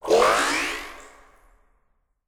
Comedy and Cartoon Sound Effects Disappear Electronic Whoosh